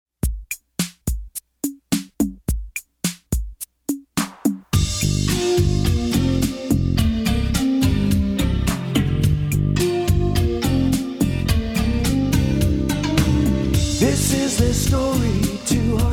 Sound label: rhythm